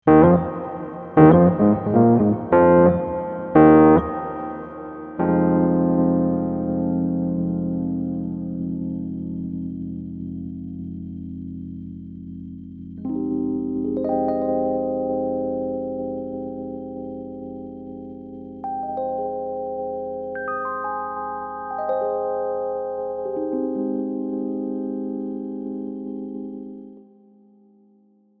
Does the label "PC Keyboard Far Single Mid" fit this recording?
No